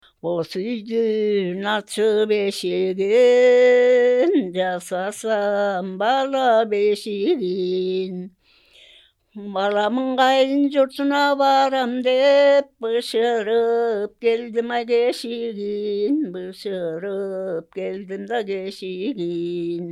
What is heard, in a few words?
song